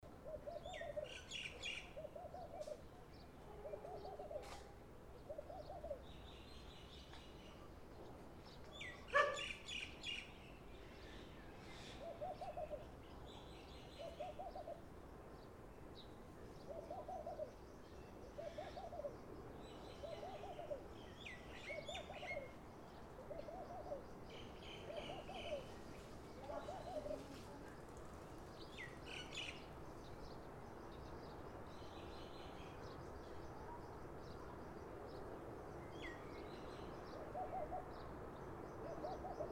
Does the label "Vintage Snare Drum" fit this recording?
No